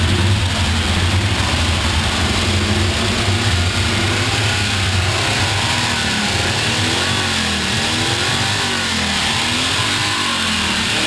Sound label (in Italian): Motore; Montreal